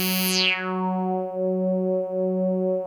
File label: PPG RESIDUDE5, PPG WAVE 2; Greytsounds VOL-1